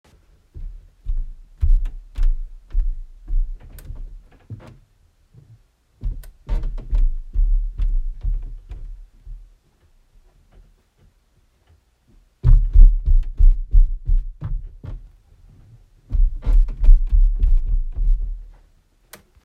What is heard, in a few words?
stairs